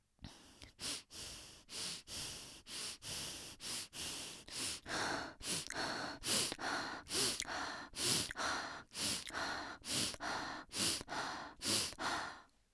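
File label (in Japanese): 呼吸音（鼻呼吸+口呼吸）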